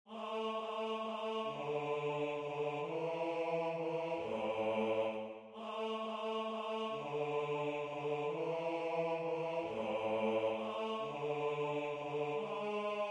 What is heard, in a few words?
Bass